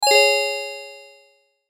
Chime Twinkle; Chimes (Sound Effects:Multimedia)

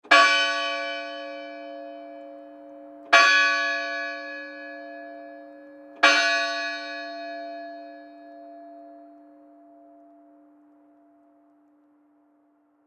Church Bells Ring Triple Close; Musical; Blastwave

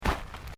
FOOTSTEPS SNEAKERS GRASS STEP; Footstep Foley; Footsteps (Sound Effects:Human)

